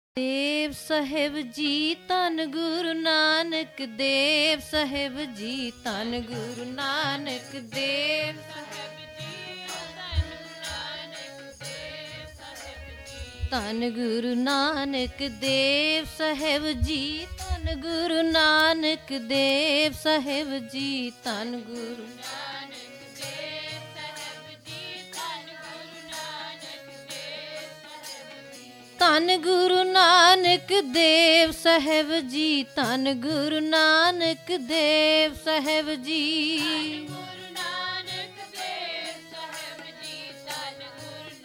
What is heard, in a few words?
Kirtan; Dhin Rain Saas Saas Gun Gaavaa Jae Suaamee Thudh Bhaavaa